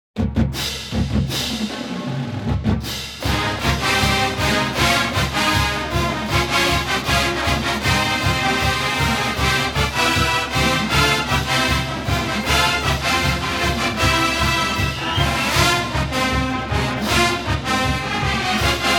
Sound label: fight song